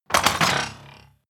transformation rattle hum

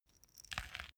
small pepper grinder glass ringing soft; industrie-wirtschaft; gastronomie

